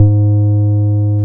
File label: BAS MKS-50, Roland